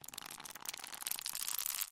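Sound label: clenching fist; Сжимание или сжатие кулака от злости, обиды или ненависти для монтажа видео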